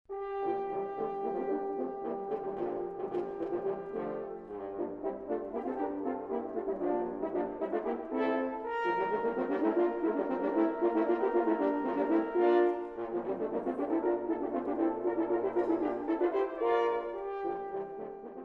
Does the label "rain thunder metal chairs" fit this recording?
No